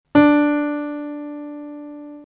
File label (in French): piano re; MOBICLIC 77; RATOCLIC